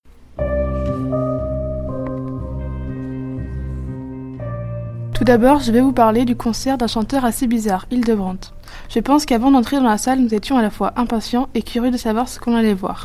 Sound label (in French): Concert